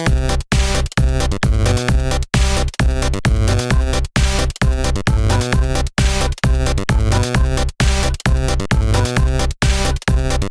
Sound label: distraction dance remix; taunts; props